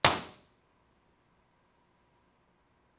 Study ImpResp

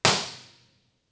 Small Room Imp Resp 16k

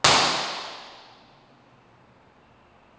Bathroom ImpResp

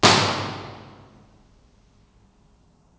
Stairwell Imp Resp 16k

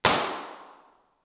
Large Room Imp Resp 16k

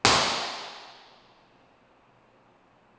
Bathroom ImpResp

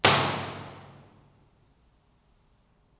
Stairwell Imp Resp 16k

